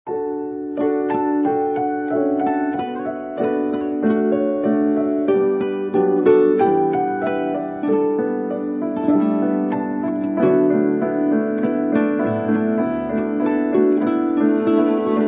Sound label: Strings